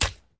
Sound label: squish